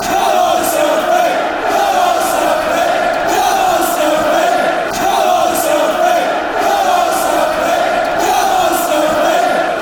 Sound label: come, chants; southend